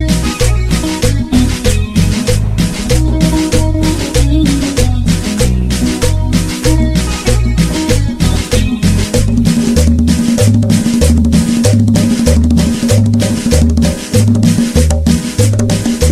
guitarritas

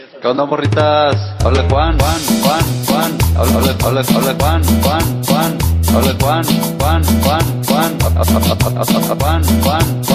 cumbia

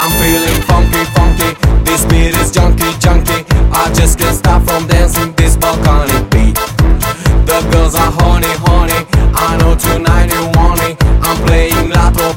La Trompeta

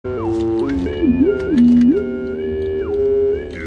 Tambor

